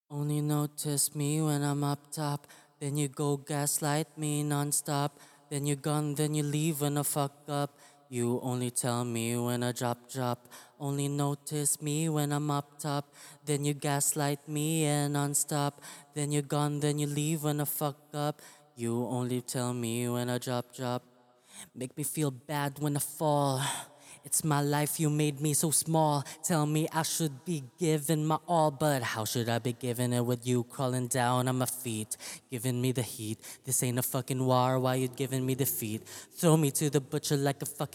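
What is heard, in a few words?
hip hop, 89, D major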